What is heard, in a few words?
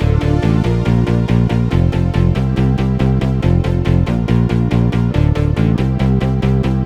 140BPM D; Vengeance - Essential Clubsounds Vol.4